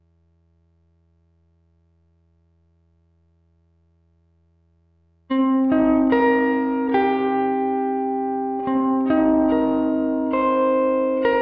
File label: gtr texture